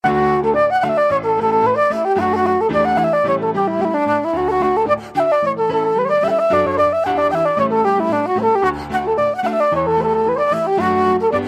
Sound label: flute